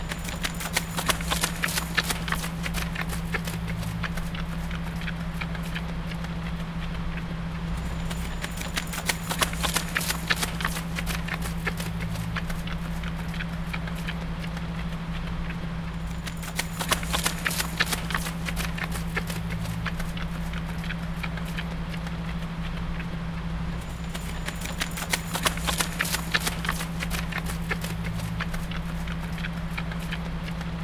Jogger im Matsch

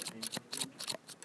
schneiden

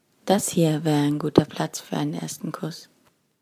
Kuss

